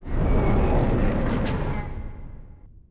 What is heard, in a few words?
Collision